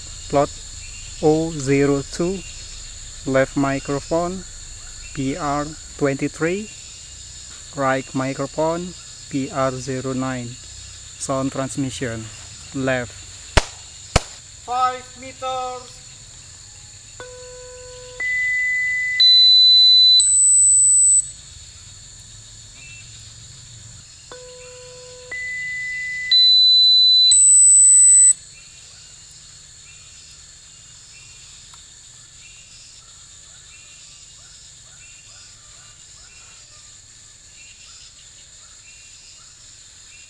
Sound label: birds